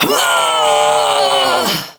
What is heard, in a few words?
Death, Barks; Kuva Lich; Persona C